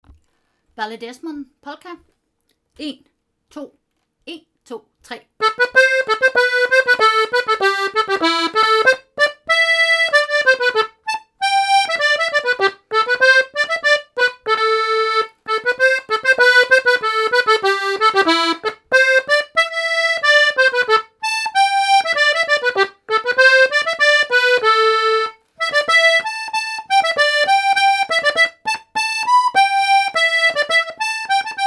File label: ballydesmond polka